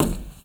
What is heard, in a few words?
roof step; Golden Crayon Sounds